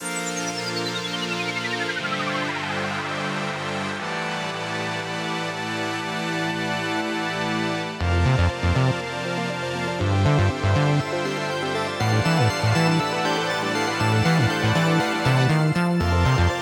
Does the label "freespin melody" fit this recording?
Yes